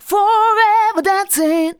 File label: vox, Techno Trance; Ueberschall